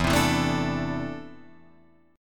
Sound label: E 7th Suspended 4th Sharp 5th, Guitar, Open F; E7sus4#5 strummed; JGuitar